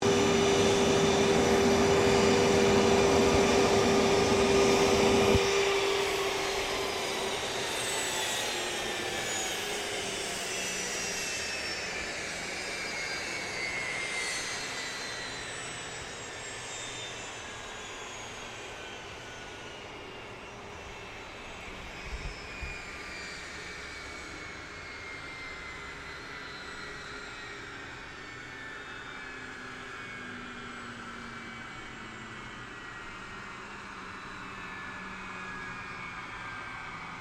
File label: AIRCRAFT CESSNA CITATION CJ3 SHUTDOWN; Airplanes